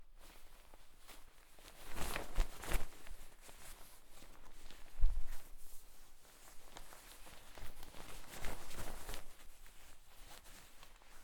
Cloth Sound Manipulation Ski Jack Manipulation Blouson De Ski